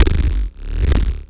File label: Cwejman S1 Gurgle Bass; DevSnd Cwejman S1 - JK; DevSnd Cwejman S1 - JK Samples